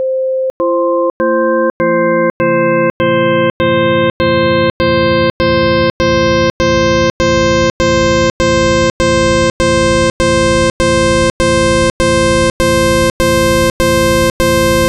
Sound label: 30C harmonies 30 percent low boost, synth